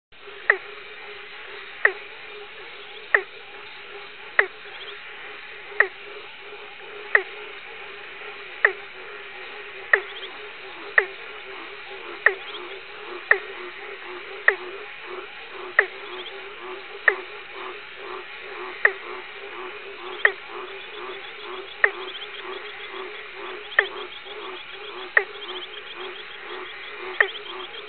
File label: frog, nature